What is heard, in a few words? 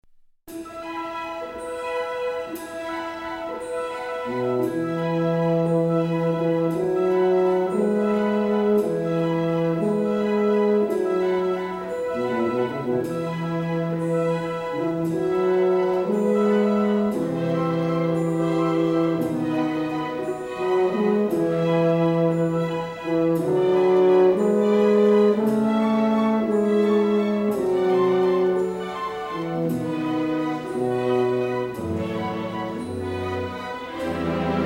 Tuba; Doodles